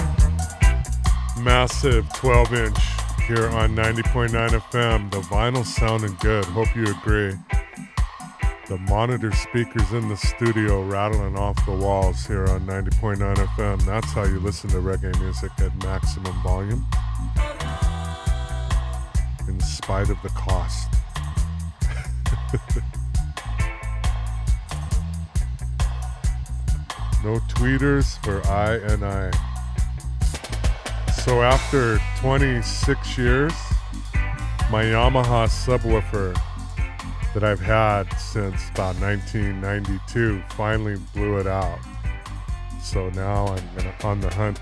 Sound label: without bass